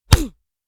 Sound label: fist punch vocal; breakhack